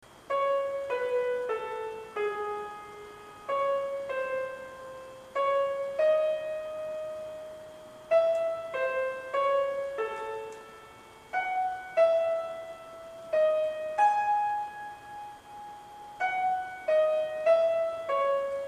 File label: diatonic exercise